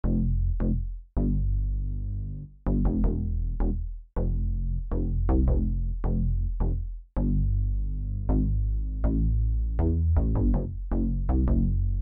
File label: bass